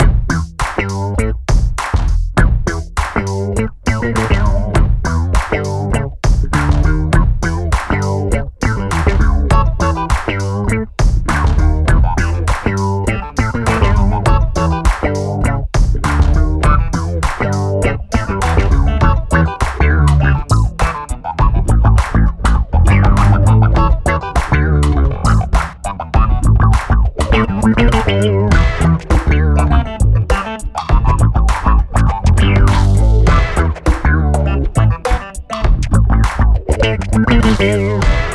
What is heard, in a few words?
Rocket Rap; ToeJam and Earl: Back in the Groove